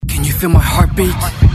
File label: Heartbeat